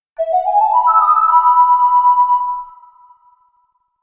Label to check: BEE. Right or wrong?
Wrong